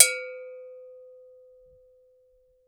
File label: GANKOKWE, Drums&Percussion, Gankokwe Bells; E-MU Producer Series Vol. 7 – Old World Instruments